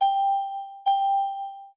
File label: ding